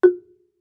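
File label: Marimba deadstroke fortissimo Gb4; MIS Pitches - 2014; Percussion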